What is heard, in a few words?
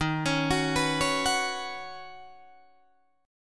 D sharp Minor 7th Sharp 5th, Guitar, DADGAD